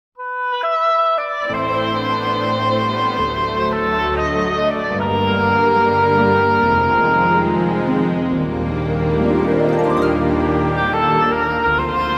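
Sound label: Bb minor Duet version; keys - Bbm and Gbm - vocal range - male C to E , female Ab to B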